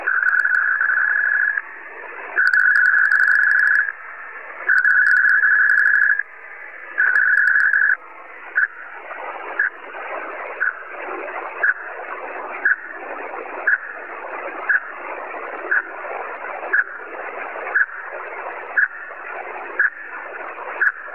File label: mode Pactor 3